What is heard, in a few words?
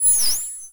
Science Fiction Sci-Fi Electronic High Pitched Ring